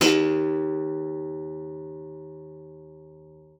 D#2, GUITAR; Xsample VOL-2

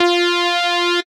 lead raba F; leads

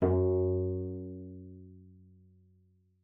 cello pizz F#2; 90 s Sample CDs; Xsample VOL-5

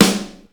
Mutt D Snare, Ensoniq ASR-X Pro

